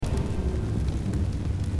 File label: torch holding loop; We Were Here Forever